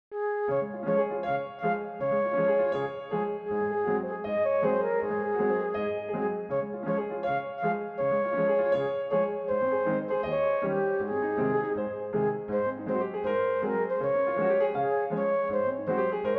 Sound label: Melodie